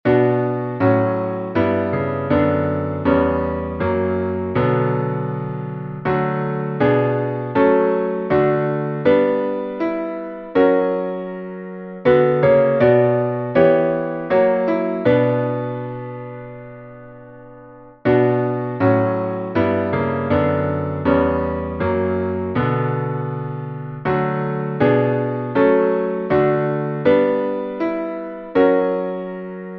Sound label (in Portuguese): salmo playback